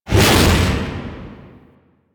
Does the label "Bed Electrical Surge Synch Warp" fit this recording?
No